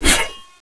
machete slash; cstrike

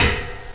ladder, player; firearms

